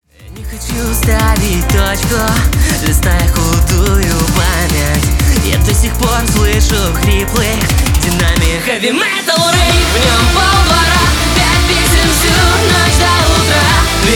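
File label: heavy metal rave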